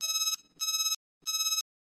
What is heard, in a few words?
Extension Ladder Alarm; Lethal Company